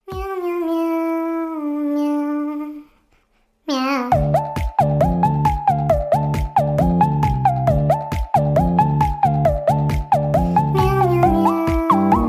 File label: bongo cat cover